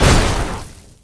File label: hand